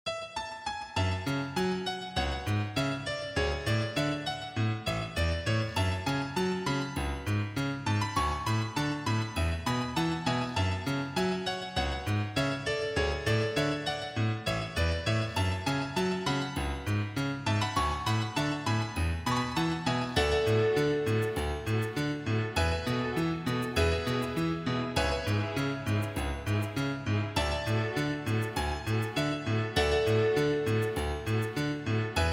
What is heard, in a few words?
sandglass Fluid R3 GM